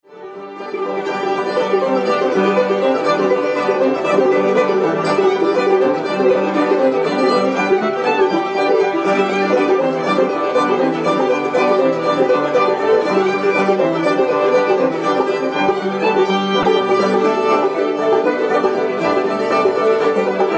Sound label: Fishers Hornpipe; Old-Time JAM